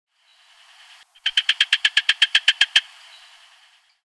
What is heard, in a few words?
Mantidactylus melanopleura, amphibia